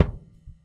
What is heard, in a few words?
Swinging, Dimensional RL Kicks; Airwindows